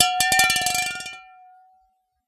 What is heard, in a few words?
Water Clinks Mono, Glass Clink In Water